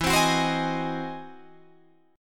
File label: E Augmented Major 9th, Guitar, Open D